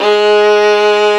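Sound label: Vio legato G#2; Digital Sound Factory Virtuoso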